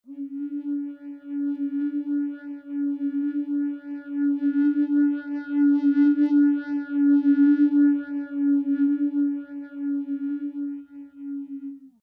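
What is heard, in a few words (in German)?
blockflöte phaser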